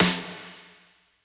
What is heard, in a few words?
80s reverb snare